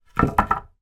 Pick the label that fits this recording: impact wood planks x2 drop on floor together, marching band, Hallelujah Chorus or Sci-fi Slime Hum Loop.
impact wood planks x2 drop on floor together